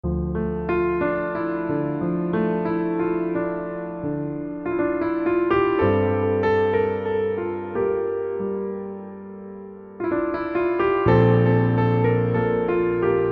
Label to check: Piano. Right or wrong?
Right